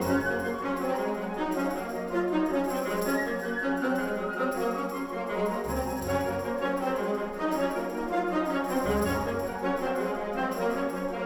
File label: Joyful 80bpm Loop; Music Dialogue